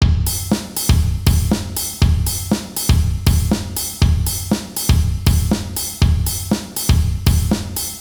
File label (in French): contre temps exo 120 bpm; Un rythme à contre temps